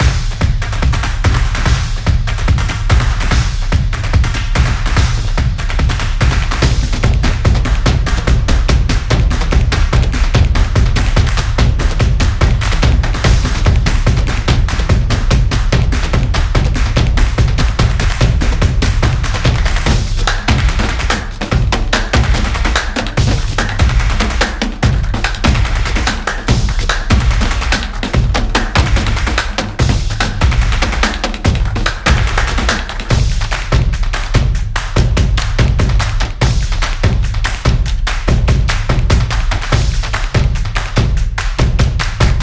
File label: Drumming Claps; percussive nation vol 5